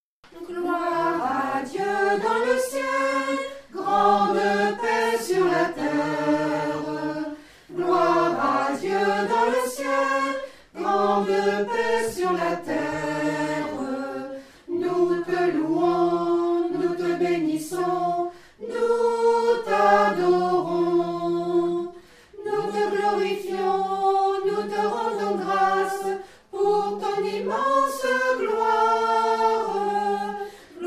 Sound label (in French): Gloire a Dieu dans le Ciel Unisson; Basse